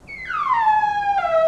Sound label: ANIML; Zero G; Man Machine